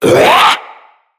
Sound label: Infested Ancient Pain; Puss Ancient